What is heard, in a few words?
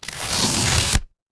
paper fabric tear